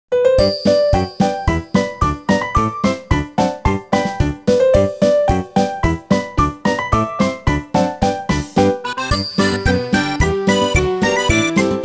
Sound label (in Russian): Polka Tanets s kolokolchikami